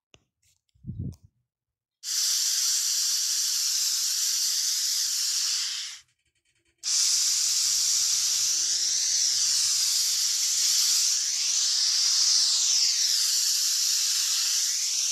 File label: smoke